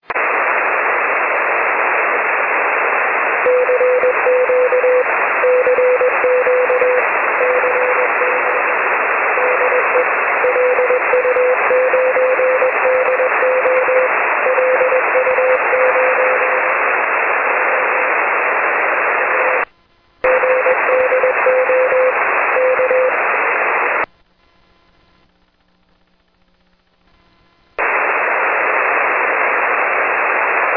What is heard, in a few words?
6m; 6 Meter; N1BUG